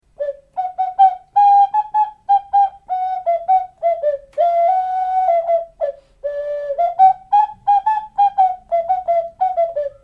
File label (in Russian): okarina